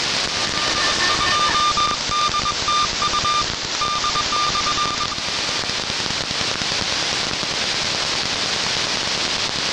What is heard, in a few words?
cw signal am demod narrow; dtusat-2